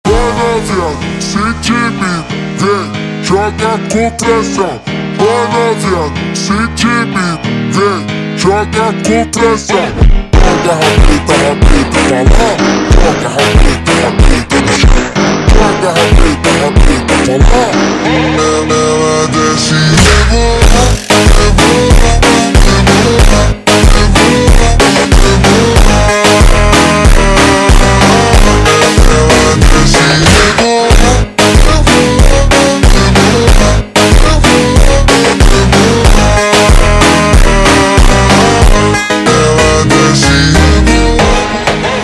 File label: Montagem ultra Slowed